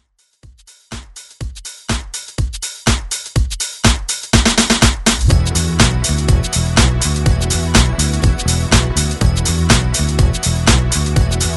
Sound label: Rock